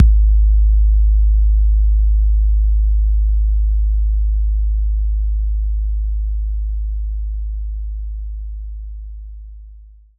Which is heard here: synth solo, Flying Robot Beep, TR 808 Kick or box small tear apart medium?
TR 808 Kick